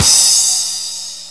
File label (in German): Crash Cymbal gekürzt, Wersi Prisma-DX5